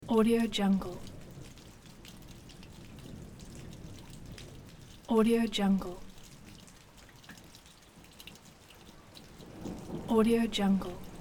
rain urban leaking pipe thunder

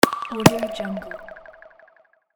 atmospheric air click; soundmasterfx

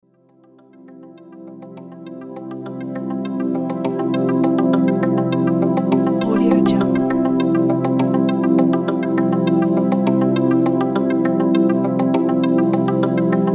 tv show quiz thinking

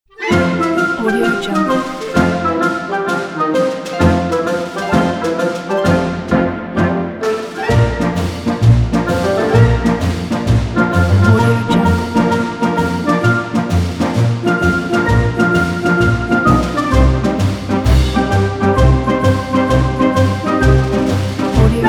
brave heroes

